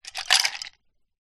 food drink ice cubes in glass liquid movement short